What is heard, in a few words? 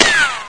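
ricochet; svencoop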